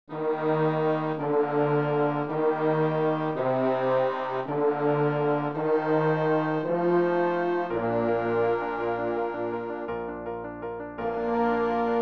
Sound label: CHRISTUS CHORAL BAS